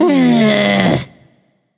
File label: sigh; danzaburou